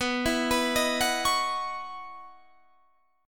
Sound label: C sharp Minor 11th B